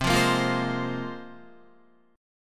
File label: C 7th Suspended 4th Sharp 5th, Guitar; C7sus4#5 strummed; JGuitar